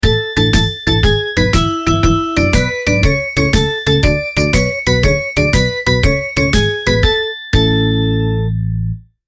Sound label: Playful Background Music With Bells and Claps